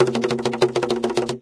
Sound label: jungle close program; windows 9x; sound schemes